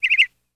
Canary Call, Chirp

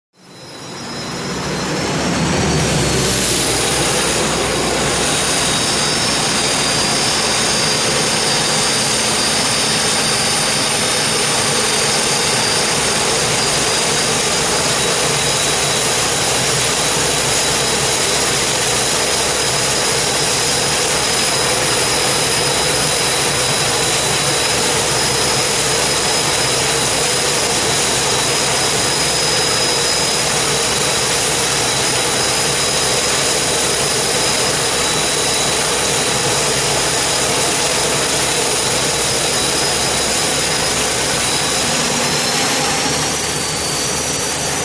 Hydroplane Idling A

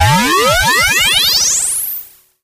raygun; rayguns

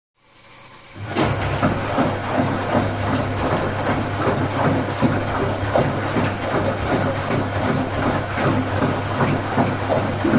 Washing Machine Wash

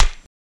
Corn Snap Low End Thwa; gore stabs & eat

